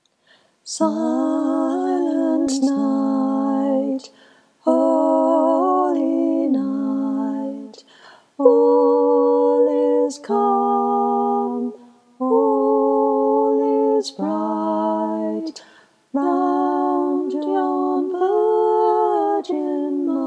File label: Silent Night, choir